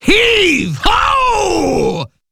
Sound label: attack Pirate; netent